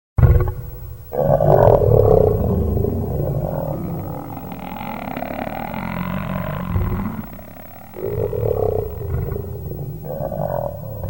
large animal attacking; SOUND EFFECTS1

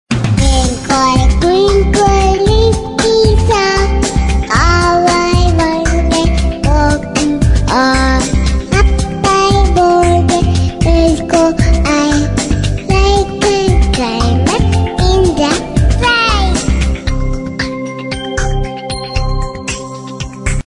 Funny Baby Ringtone

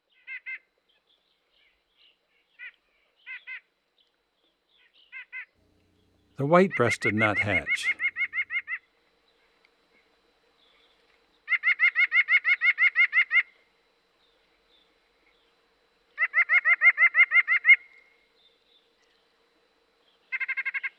White-Breasted Nuthatch; Eastern North America; Dan Gibson; Wildlife Identification By Sound

